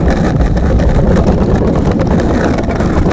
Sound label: roll wood pier, terrains; Tony Hawk's Project 8; XBOX